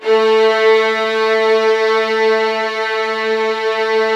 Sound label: LDFV A3, LOUD VIOLINS; Q Up Arts; Denny Jaeger Master Violin Library